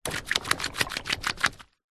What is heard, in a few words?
balloon filled water small shake wobble; foley; zapsplat